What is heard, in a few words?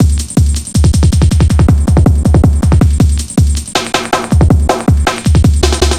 160, Drum Loops, Drum & Bass; Total Drum&Bass